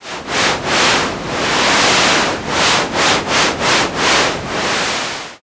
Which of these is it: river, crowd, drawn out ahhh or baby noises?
river